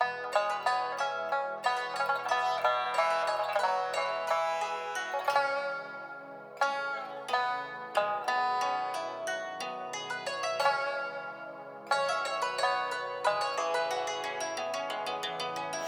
BGM Paytable; Wealth Gods2

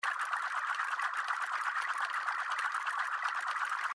REEL SPIN; Jamaican Jackpot

